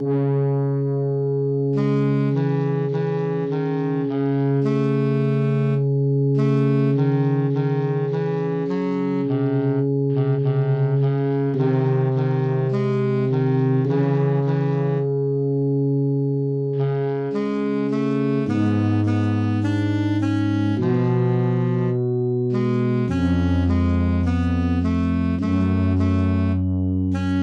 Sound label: MIDI